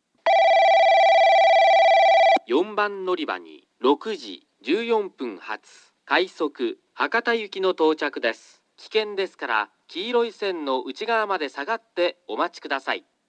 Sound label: kurume rapid hakata; 4番のりば接近放送（快速 博多行き）